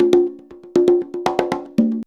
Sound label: CONGA 119